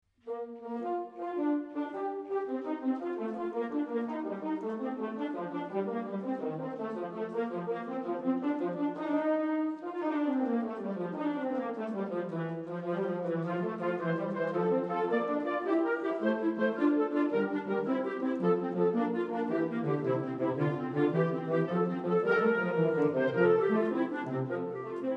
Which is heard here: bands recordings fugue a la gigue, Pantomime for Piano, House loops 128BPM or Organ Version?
bands recordings fugue a la gigue